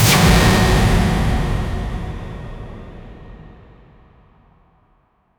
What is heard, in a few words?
jump scare sting